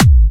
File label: BD Punchy; Vengeance Samples; Bassdrums